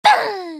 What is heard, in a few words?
grenade; csgo; custom radio